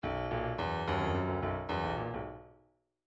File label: bdf midi file grooves funk l funk l